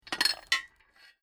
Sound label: Metal Chain Movements; Chain sounds